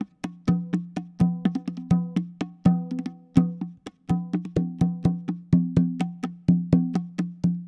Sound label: PERCLOOP ETHNO 125; eJay